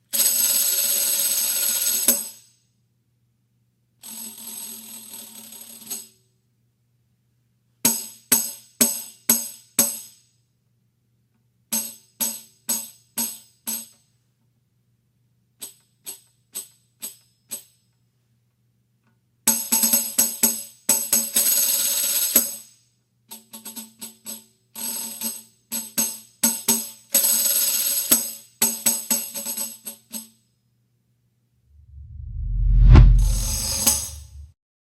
Tambourine

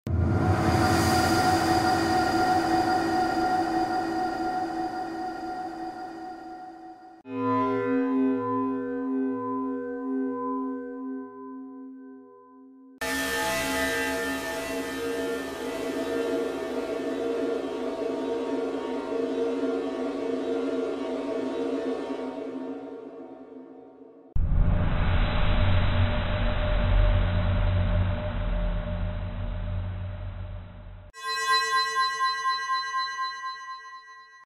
Mysterious space

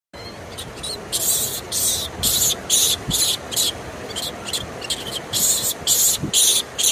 Japanese macaque; ماکاک ژاپنی

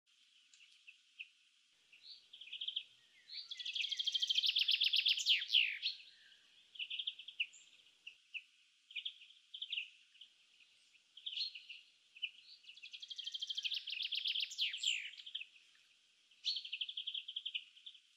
Fringilla coelebs female; سهره خمسه ماده برای جلب جفت

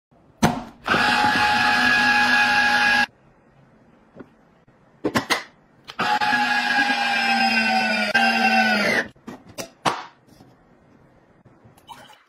Juicing; آبمیوه گیری

